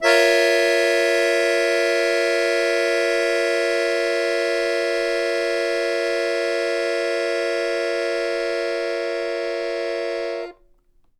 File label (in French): accordeon